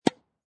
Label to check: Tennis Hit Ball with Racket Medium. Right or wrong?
Right